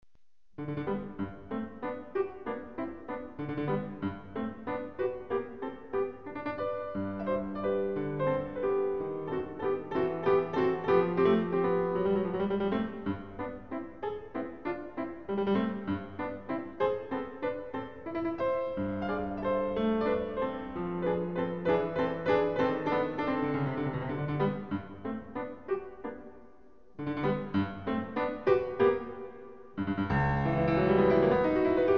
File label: Toccata